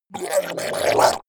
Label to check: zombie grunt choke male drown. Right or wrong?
Right